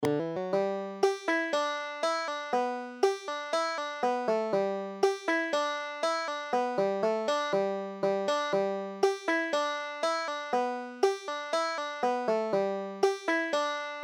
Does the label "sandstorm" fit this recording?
No